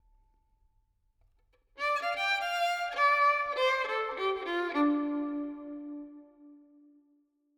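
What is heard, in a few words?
Verb, Violin, 4-4, D minor, 100 bpm; Sample Banks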